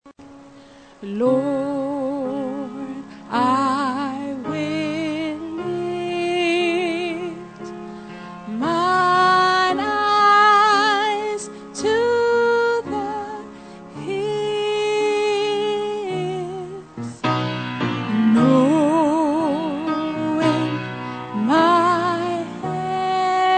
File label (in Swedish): bas